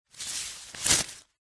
magic plant vines leaves movement wrap around grab reversed